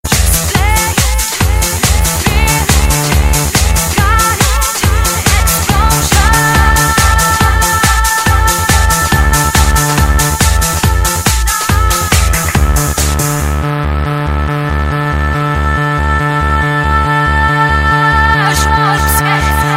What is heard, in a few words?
Eletronica Explosion; toque de celular